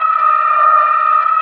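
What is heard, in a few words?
mournful scream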